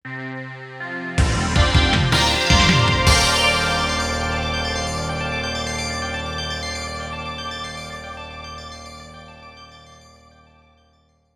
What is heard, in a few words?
Logo Jingle; Slipstream